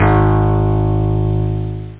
ACOUSTIC GUITAR

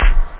drums bass; da capo

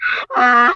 HOLY DONKEY IMPACT; worms

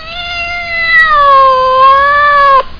cat (crying); Epic Interactive Encyclopedia

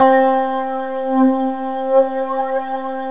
70's fusion guitar

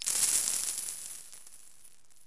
many coin; Wired for Sound Pro CD; Aristosoft, Inc.

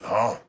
Grunt; Denkspiele; Pirates Of Treasure Island

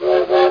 toot

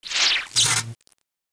Laser Lab Sound

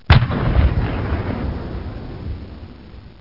heavy cannon fire

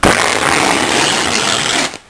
Ripping

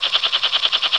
beeps

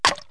Juice Can Puncture Open; KABOOM! - Wild and Wacky Sound Software; Household; Foods

